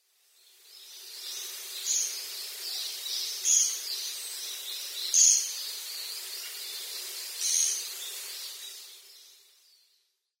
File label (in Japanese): amamikogera, amami, koe; yanagawa